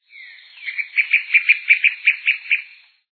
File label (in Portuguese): trepador-coleira